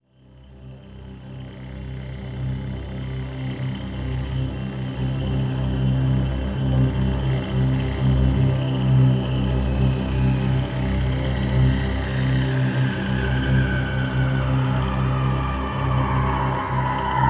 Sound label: Low Complex Voice, Complex Wave; Sonic Foundry (Sony Creative Software) - James Johnson Spektral Minimalism